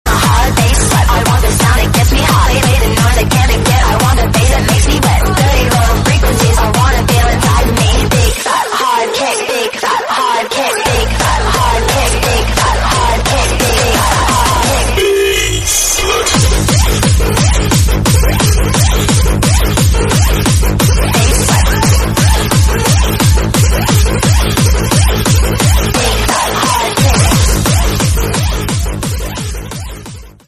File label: original bass